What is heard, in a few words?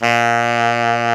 SAX TENOR, Super Sax Tenor mf; Roland LCDP07; Tenor mf&ff